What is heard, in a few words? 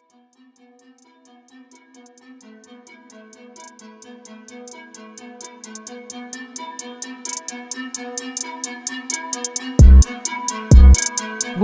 Training Beat